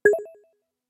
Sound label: message Alert